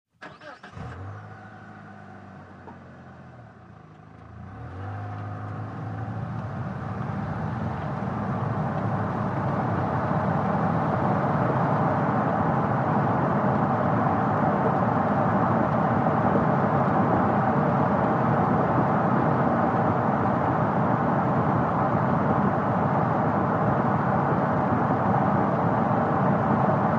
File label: Sedan Eng Accel Stop